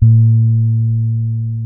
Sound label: B 3, old soul, bass; Spectrasonics; Bass Legends